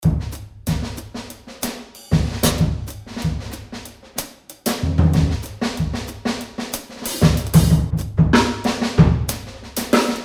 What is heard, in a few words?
breakcore breaks; DOA breaks; ephemera breaks